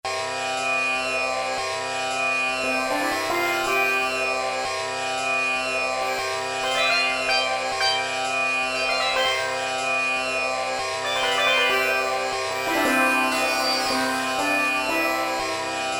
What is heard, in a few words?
Tambora, Indian Inst; Korg DSS-1